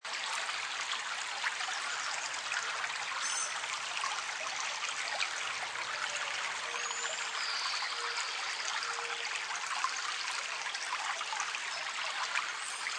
Birds By The Stream Loop, Light stream, brook or creek running through forest with subtle sounds; Seamless loop; Forest Sound Effects; birds singing cheerfully